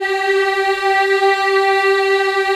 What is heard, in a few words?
G3 women eh, Choir Acoustic & Synth; USB Soundscan vol.28; AKAI